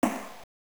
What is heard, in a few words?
pop